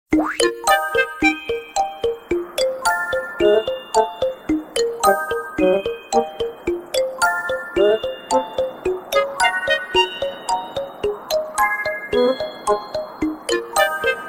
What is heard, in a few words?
Toad House (Outside) Theme